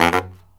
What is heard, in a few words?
Killer Brass Riffs